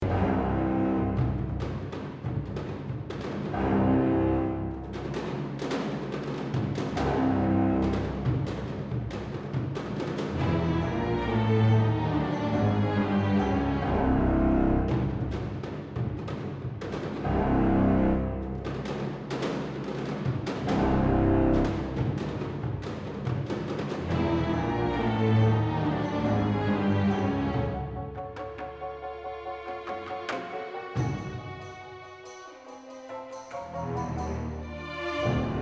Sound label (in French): Poursuite LOOP; From the Shadows